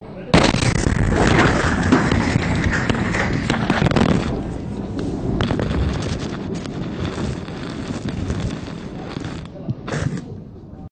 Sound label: bomb noise